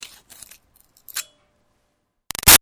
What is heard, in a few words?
Foley Rock Picking Archaeology Moves; Rocks; Materials